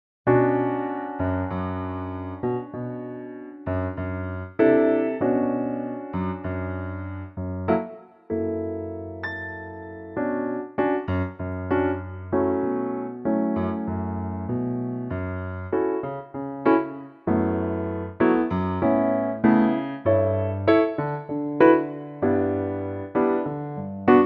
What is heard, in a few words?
Bm piano; key - Bm - vocal range - F# to B